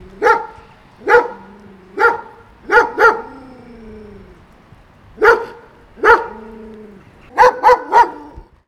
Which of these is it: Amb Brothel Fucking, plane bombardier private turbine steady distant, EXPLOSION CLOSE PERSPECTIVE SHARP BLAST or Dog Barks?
Dog Barks